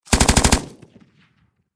Warfare Machine Gun 7.62 Caliber Pkm Russian Short Burst Close Perspective; Machine Gun Sound Effects; SFX Bible 6